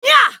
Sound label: ultimate effort; Sejuani; Character Voices - English